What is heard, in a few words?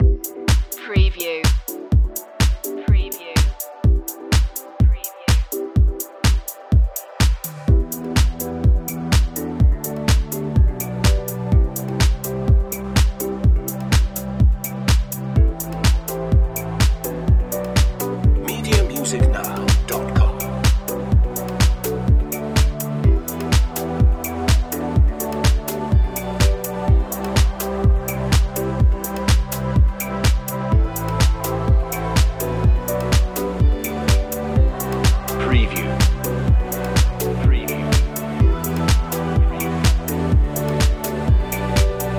dreamy electro vibe